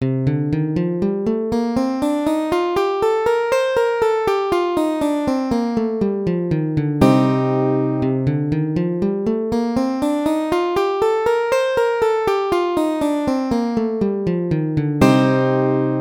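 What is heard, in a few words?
cm dorian scale; cm drian scale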